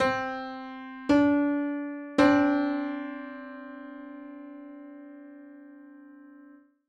Piano 7edo; Piano 1

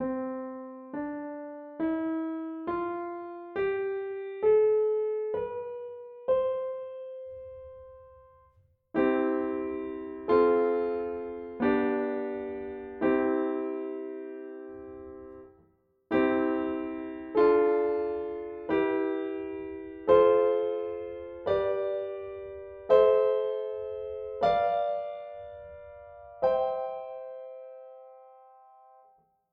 29edo Nice tone

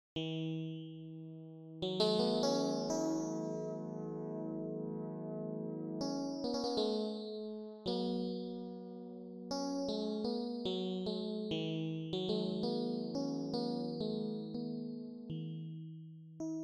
24EDO Surge DX EP Jam; Nick Vuci

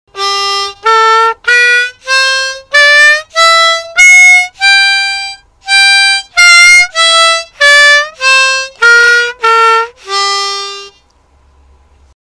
Harmonica

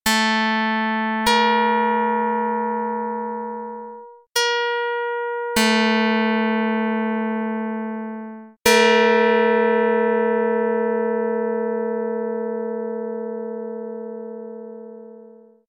pluck; 13/6; dr220

